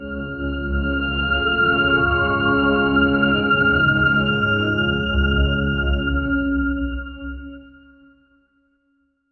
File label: shocking tense mystery th